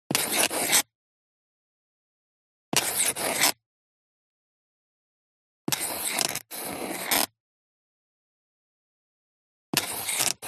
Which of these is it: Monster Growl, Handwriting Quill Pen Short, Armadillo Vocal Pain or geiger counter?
Handwriting Quill Pen Short